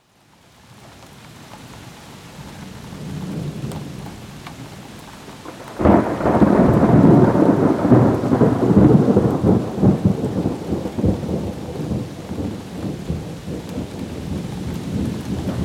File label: thunder and rain